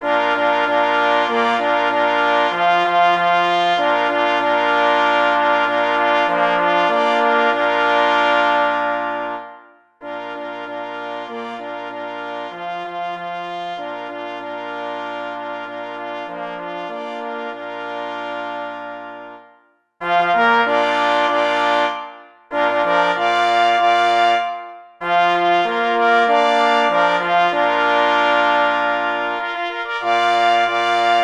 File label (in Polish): Hej na byka 2 plesy i 2 parforsy; Cz. Trybus